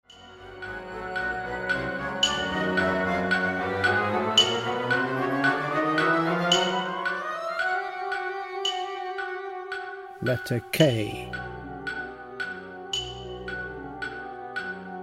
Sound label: exc with clicktrack; Mendelssohn